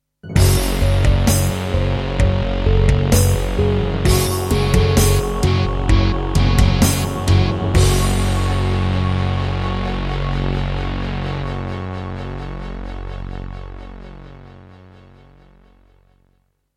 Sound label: Yamaha MU2000EX XG MODE; Doom (Sigil); Introduction